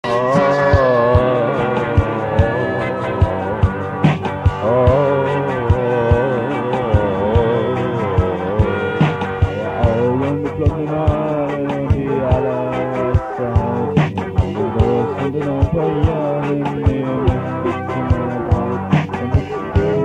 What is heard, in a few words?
Reaggae